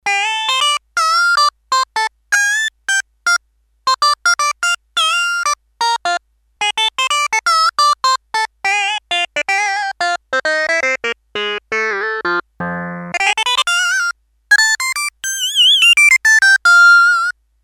Synth Lead, Korg DS-8; Factory Patches

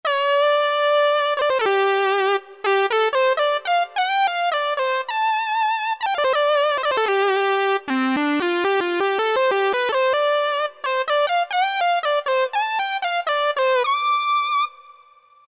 led syn; E-mu Proteus FX; Factory Presets; SynSushi

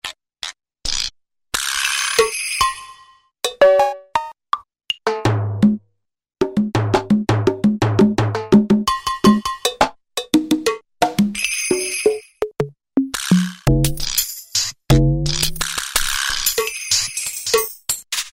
Perc., Roland SR-JV80-19 House Collection

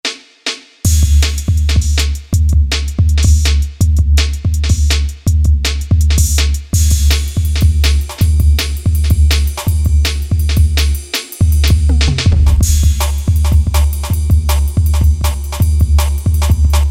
JUNGLE, Factory Rhythm Sets, Roland SC-880

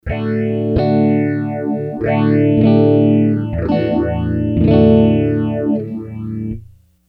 Phase Gurgle; Sony HR-GP5

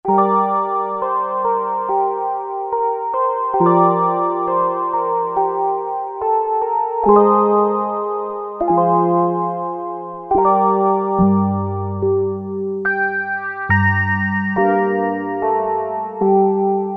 Chorused Bell, Roland Juno-106; Factory Preset

